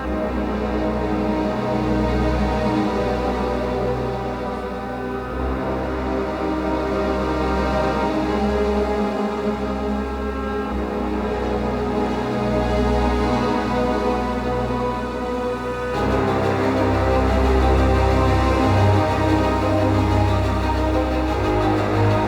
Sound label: Room Transform; Entrati; TennoCon 2023